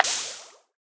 Poison Hit; smite; raijin

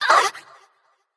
grunt hit light; smite; voicepack; hel

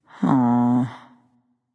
nv sigh; smite; awilix

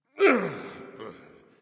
grunt; Smite; bacchus

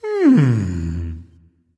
content, voice; Smite; ymir skin12

